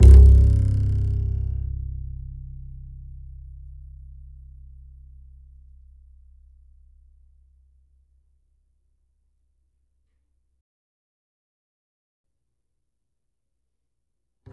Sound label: E, E string upright bass; Larry Seyer